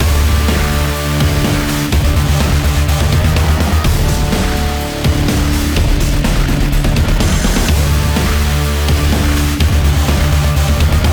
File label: Music Level Complete; neon shadow